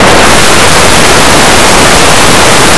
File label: crumple; Blue Heat